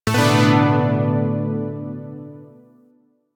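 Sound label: Shawzin Major Chord L; Zariman Shawzin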